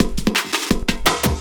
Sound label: LOOP, 170 BPM